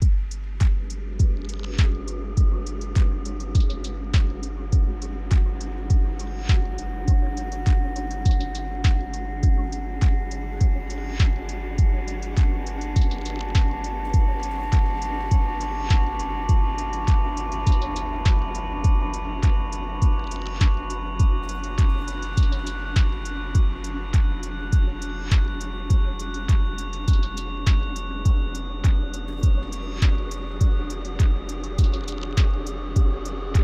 Light Cardio Workout Soundscape

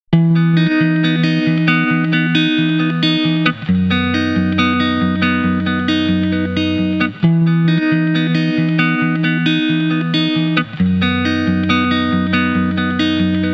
instrumental

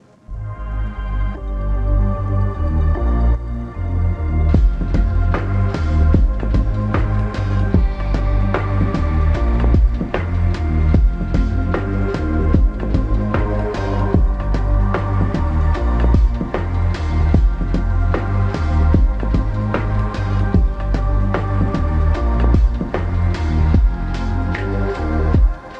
Gaming Soundscape

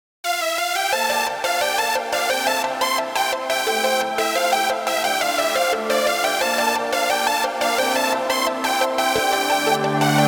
Game Instrumental; Puella Magi Madoka Magica OST3

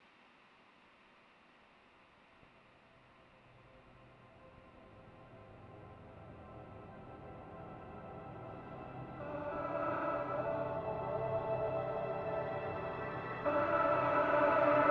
Cool Down, Workout Soundscape

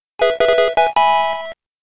Fanfare!

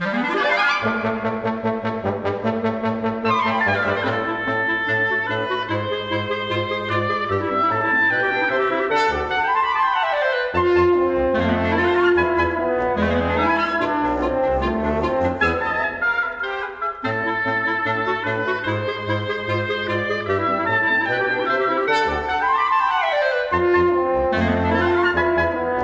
battle, woodwind quartet

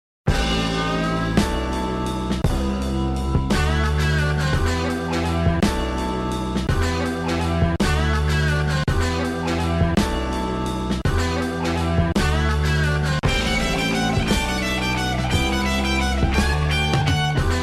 Instrumental

